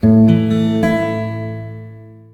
trash empty

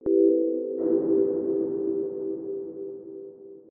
message

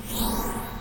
camera shutter

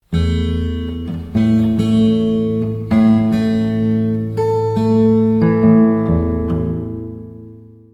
alarm clock elapsed

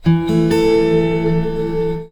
message new instant

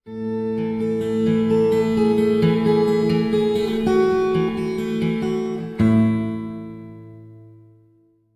desktop login

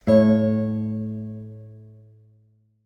guitar

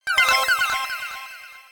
message new instant

